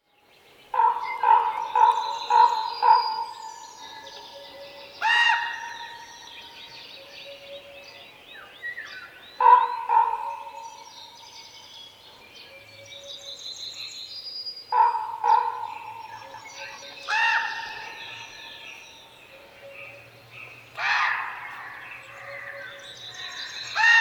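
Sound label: voron golos, raven voice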